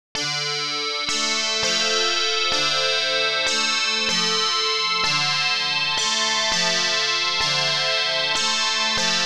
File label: high S